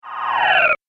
Magic Power Down; Magic Sound Effects

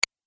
Multimedia Tiny Click; Click Sound Effects

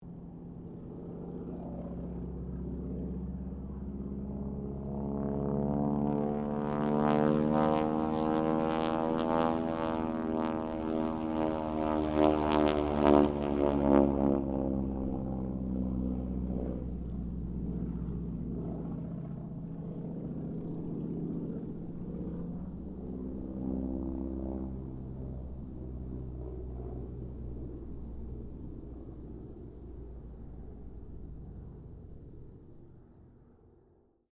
Harvard squadron 4 pass, stereo

